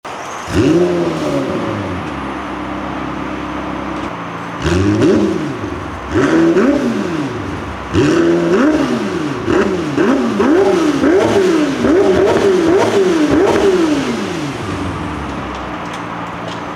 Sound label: 720 STOCK SOUND; performance